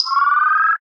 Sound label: menu start; cdogs